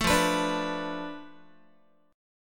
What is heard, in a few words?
F 6th Flat 5th F down Guitar, chord; F6b5 strummed